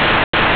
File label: comm On Off; TacOps